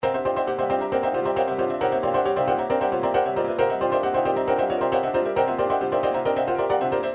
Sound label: trance midi loops panning; newtronic trance constuction kit